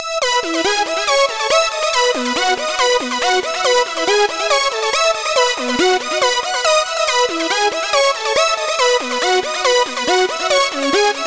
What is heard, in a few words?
Synth Sun Sequence, 140 BPM; Vengeance Samples; Vocal-Hook Kits; Song Kit